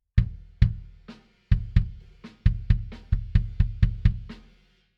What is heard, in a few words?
BD In